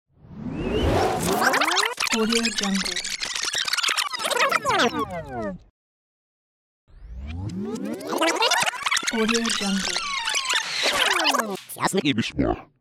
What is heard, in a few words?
rewind transition sound effect